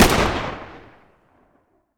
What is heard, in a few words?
m60 fire; mg; garrysmod